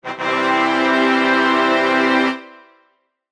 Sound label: cartoon success fanfair